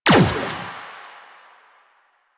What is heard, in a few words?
Shot Fire; Warframe; Akarius Prime